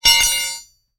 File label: metal pipe drop